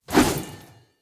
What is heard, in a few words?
Spear Throw; Weapons; Player